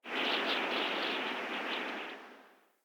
Prairie Day Wind Gust Soft

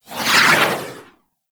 Doom Sword Projectile By; Warframe; Excalibur

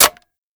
Magnum Mech; Warframe; Tenno

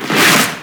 Unearth Proj By; Octopede; Warframe; ManInTheWall

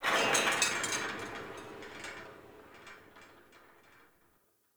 Exterior Tractor Metal Groan; Ambience; Props; Warframe; Duviri

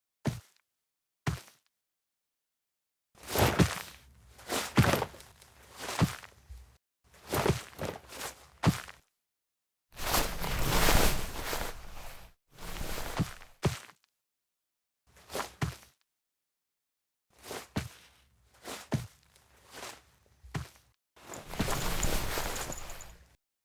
Foley; Cinematics; Drifter Lotus Operator Awakes

